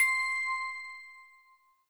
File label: bell